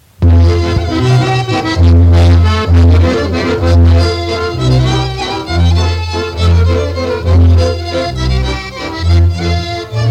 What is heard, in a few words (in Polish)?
Instrumentalny